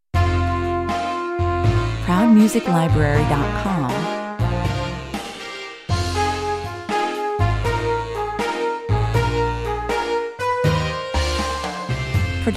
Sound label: trombone section blues